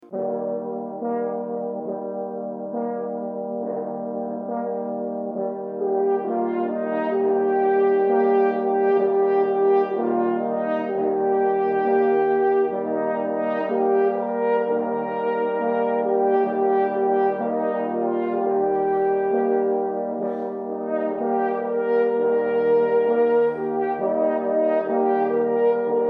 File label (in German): Glocken